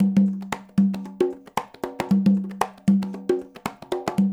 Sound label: loop, percussion; InVision Interactive; Percussion Slam; PRIMADONNA